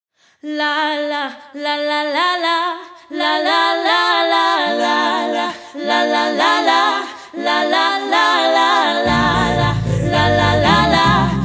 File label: Youth Choir